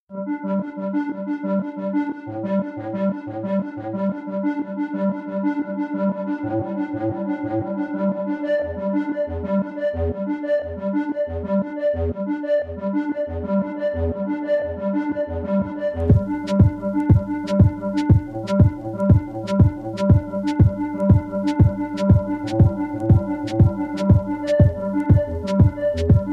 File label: Casual Dance; Toada